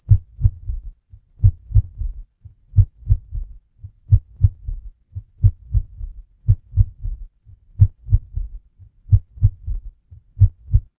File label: horror heartbeat